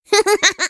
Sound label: laugh; Teemo